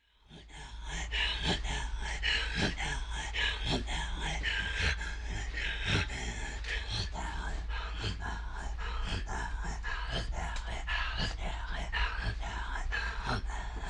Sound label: Inuit Vocal